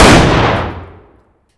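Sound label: fire distant 338lm rifle interior; gmod; arccw uc commons attachments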